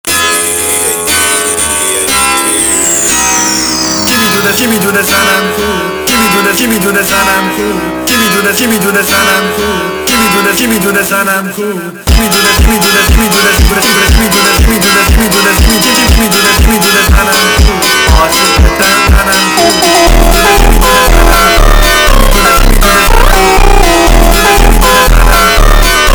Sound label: Funk